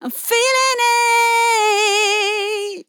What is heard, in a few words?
LYRICS Fills 120bpm Fm